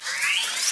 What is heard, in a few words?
wonder cannon charging; weapons